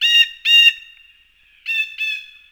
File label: blue jay; WAR